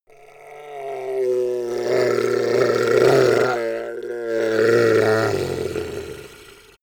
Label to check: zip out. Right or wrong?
Wrong